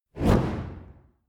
fire torch whoosh fast hard; swooshes-swishes

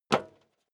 step leather shoes metal dry single stair up; schritte

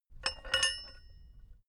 metal bolt ring; industrie-wirtschaft

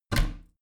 bathroom cabinet both close regular; objekte; tueren

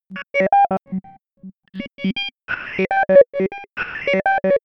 alien biomedical computer processing; digital

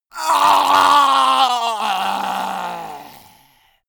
male die gurgling; soundpool

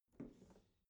bathroom cabinet open soft smooth; objekte; tueren

